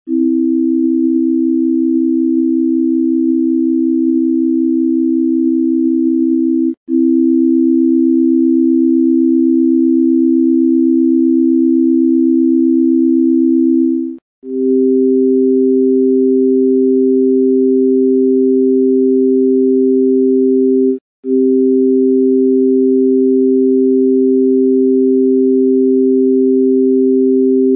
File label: 3rd and 5th nat eq